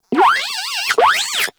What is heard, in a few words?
Moa Compassionate Vocal Reward Praise; Warframe